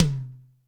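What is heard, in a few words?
dry tom, dry kits; Crypton; DrumBase5000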